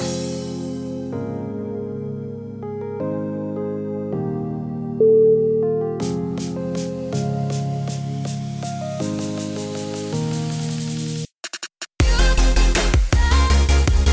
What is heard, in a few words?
future with bass